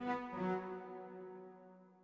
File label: orbit cello; Sky Children of the Light gamerip